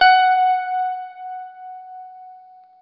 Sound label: Guitar & Bass; Quantum Leap; 90's Sample CDs